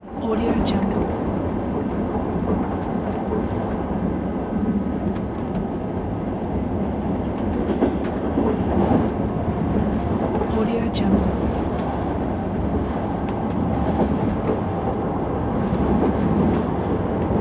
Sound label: atmosphere inside passenger train